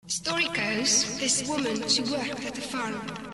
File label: delay